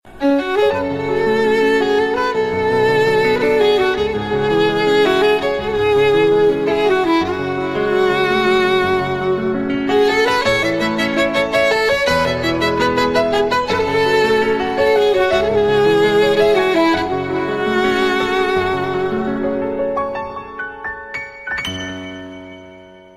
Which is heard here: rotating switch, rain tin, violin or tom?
violin